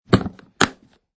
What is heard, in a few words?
Suitcase Unlock